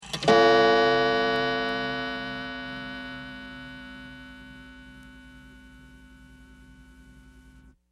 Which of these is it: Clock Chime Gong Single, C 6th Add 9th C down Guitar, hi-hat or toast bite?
Clock Chime Gong Single